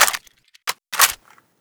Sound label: uzi clip in, weapons; garrysmod